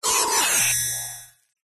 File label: science fiction machine or robot glitch